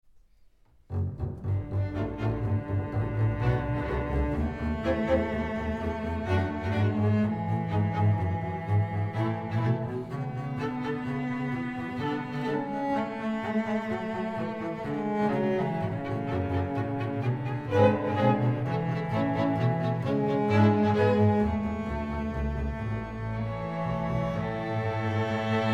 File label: courante